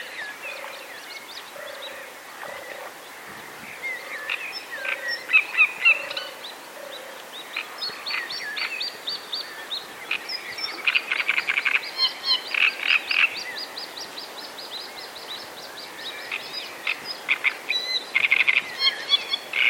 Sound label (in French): tourterelle loriot; rousser